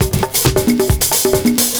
PERCS, 70 s Breakbeats

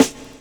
SD, snare, 70 s Breakbeats; USB Soundscan vol.46; AKAI

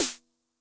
SNARE DRUM High Tune Med Tone, 909; classic machines; 909mod

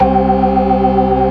organ, all stops; Keith Emerson Signature Series

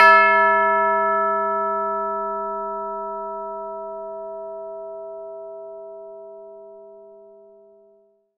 CHIME F#3; Synclavier Percussion; World & Orchestral; ILIO

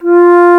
Alto Flute mf F2; Multisamples; Digital Sound Factory Virtuoso

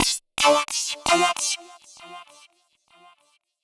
132bpm Lines n Loops Synths, uk garage samples

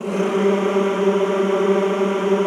G2, Classical Choir; Best Service; Extended Classical Choir

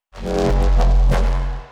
neuro, bass

